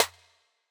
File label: snare G, hip-hop drum kit; 99Sounds x Monosounds; Moon